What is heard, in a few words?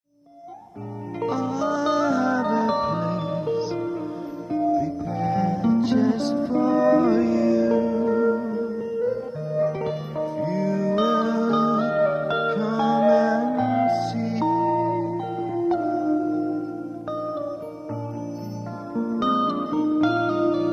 instrumental